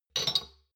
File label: shot glasses pull out medium, gastronomie; berufe; soundpool